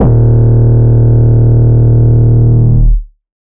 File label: 808 Bass Gargle Eb3; Slate 808 Deep Dive; SD Signature 808s